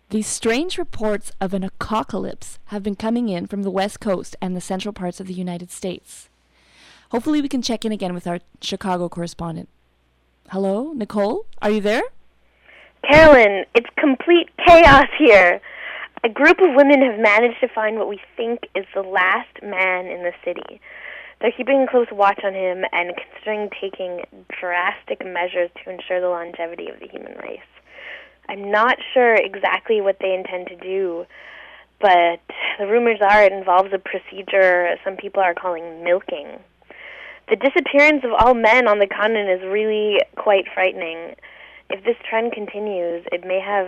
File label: WITH DIAL TONE